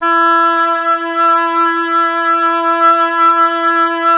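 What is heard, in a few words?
oboe, wind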